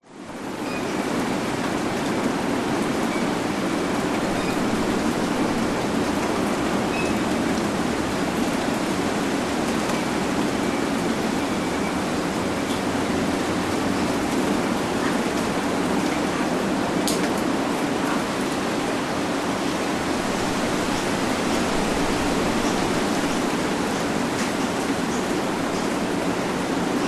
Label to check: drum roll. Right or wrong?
Wrong